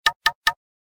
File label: Knock; Optimus G Pro F240K Lollipop